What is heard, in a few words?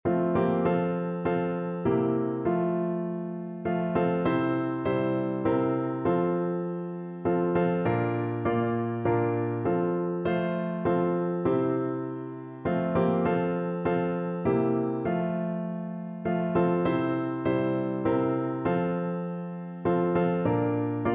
trust and obey piano